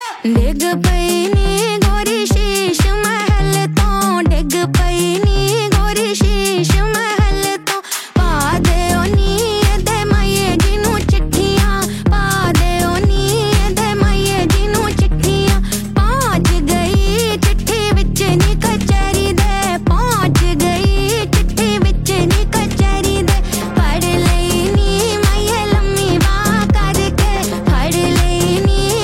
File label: Ringtone